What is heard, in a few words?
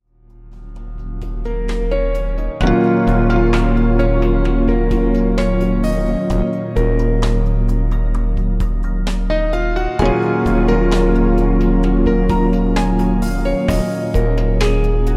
Relaxing